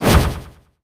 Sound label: thought route cut in